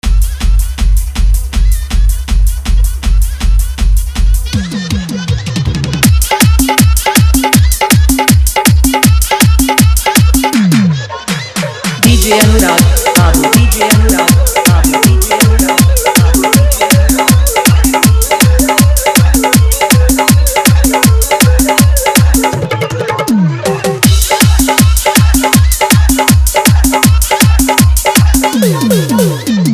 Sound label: New Bhojpuri Song Fully Dhollki Bass Dance Mix